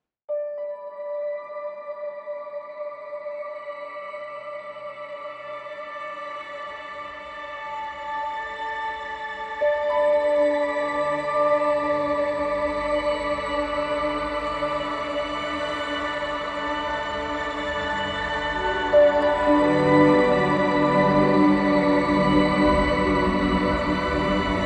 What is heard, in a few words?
Galaxy Harp; Kompose Audio - Galactic Voyager Series: Arcturus Nova; Kontakt